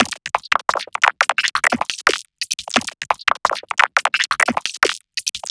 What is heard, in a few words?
cool perc loop